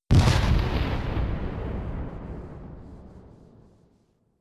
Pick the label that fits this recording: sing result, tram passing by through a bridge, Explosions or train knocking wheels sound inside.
Explosions